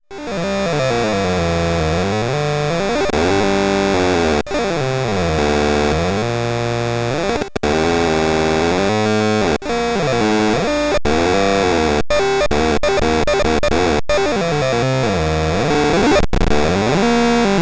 Sequencer als Wavetable Oszillator

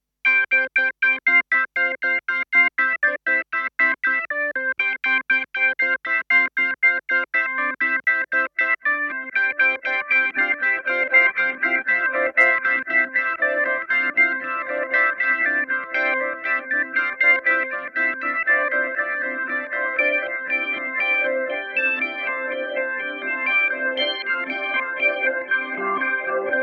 Strymon Big Sky Magneto Orgel

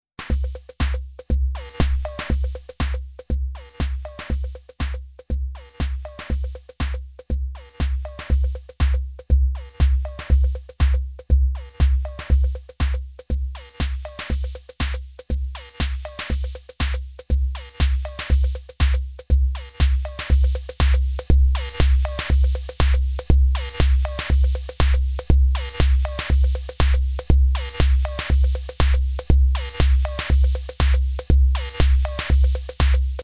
Drum Sounds mit dbx processed

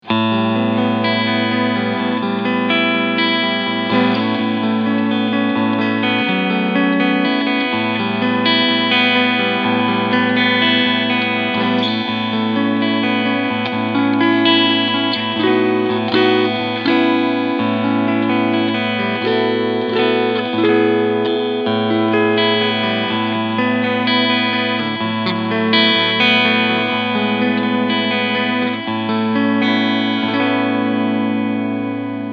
Cort X-700 Duality E-Gitarre Cleansound Position 2

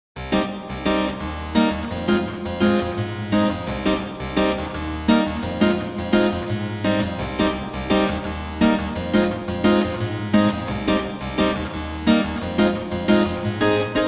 Virginale

